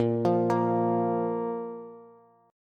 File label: A sharp 5th A sharp Bass